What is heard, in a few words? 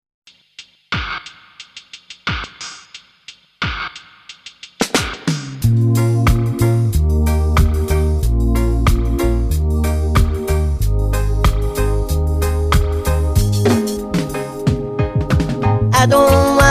Reggae, Reggae Vocal Tribute Show; The Legends of Reggae